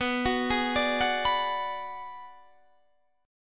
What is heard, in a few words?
B Minor 6th B Guitar Drop D, chord